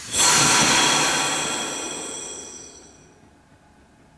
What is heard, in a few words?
emergency, Train; Y8420 ter; OpenBVE